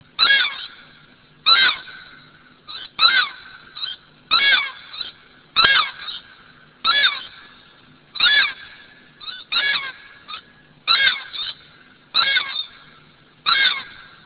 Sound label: TAKAHE